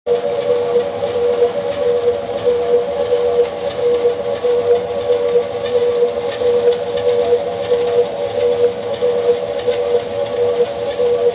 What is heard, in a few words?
siren ship